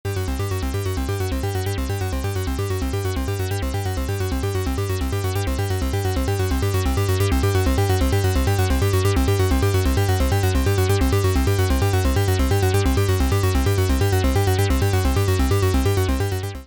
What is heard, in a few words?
ACL Filter Resonance Boost; ACL System 2